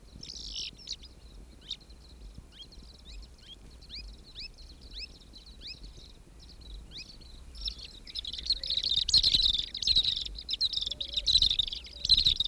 Ceryle rudis -- Pied Kingfisher

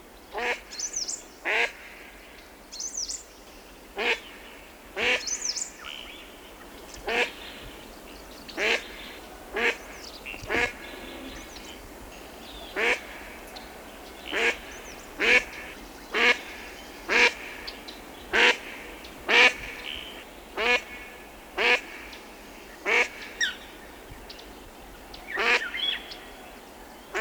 Anas undulata -- Yellow-billed Duck

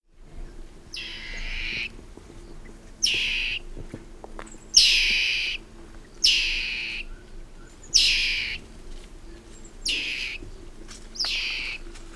Common Dwarf Mongoose

